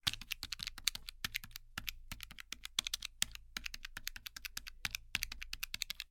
office calculator buttons press

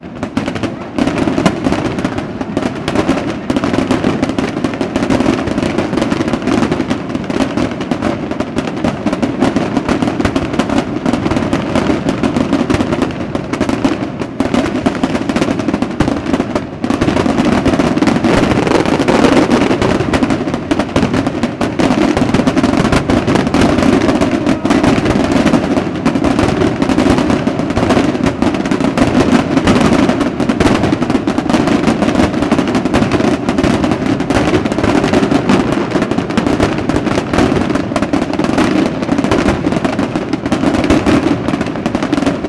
fireworks display intense; sound effects one

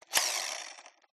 toy car rc wheel grip flick spin manual hard; Skyclad Sound

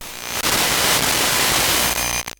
glitched tones AM Radio Interference

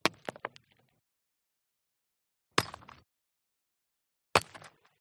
Rocks Throwing Debris; PMSFX

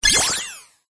science fiction cyberpunk beep noise; zapsplat

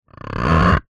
drinking glass rub squeak creak finger; foley

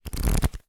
leisure playing cards pack flick through

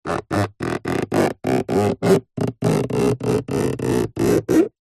metal rub finger squeak; zapsplat

